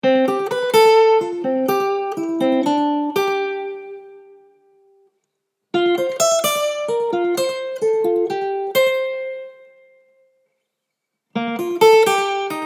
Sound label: major scale lick